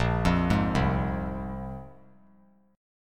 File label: A sharp 7th Suspended 2nd, chord; arpeggiated; JGuitar